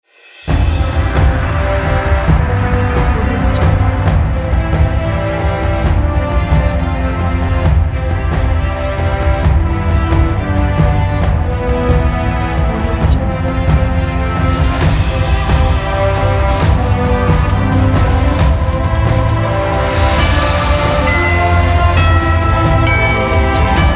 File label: triumphant commercial